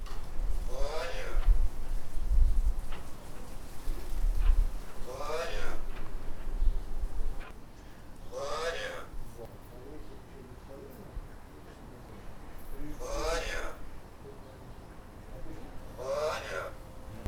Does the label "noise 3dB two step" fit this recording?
No